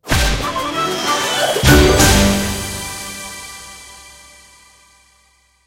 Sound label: largewin; irish pot luck